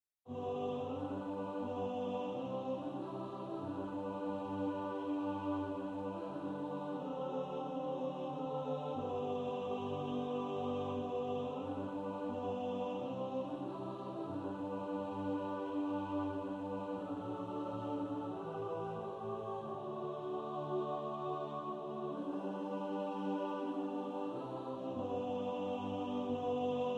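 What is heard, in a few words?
alto, practice music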